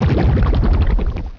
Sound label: Acid Spray; pokerogue; battle anims